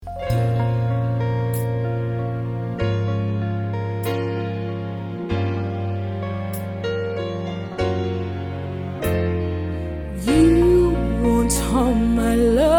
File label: JAZZ-LYRICAL SOLO YOUTH